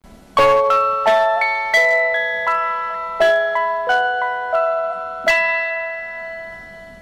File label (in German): serenade orgel